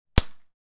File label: Paintball gun impact hitting a human body; sport